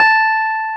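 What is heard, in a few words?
clavinet A3; AKAI S-Series CD-ROM Sound Library VOL-8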